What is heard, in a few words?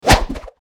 swing curving